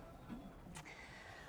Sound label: Birds